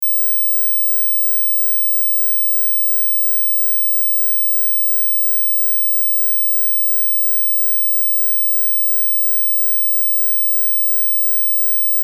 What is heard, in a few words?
mosquito 17kHz